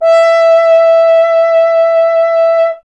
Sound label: F. Horn; Miroslav Vitous; Solo Instruments VOL-2